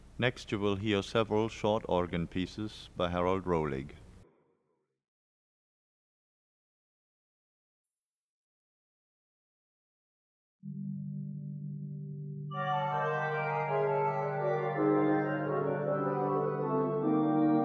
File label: Short Organ Piece; Rohlig; Harald's compositions for organ